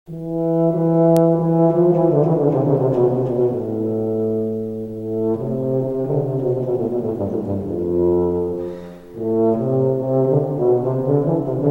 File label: Tuba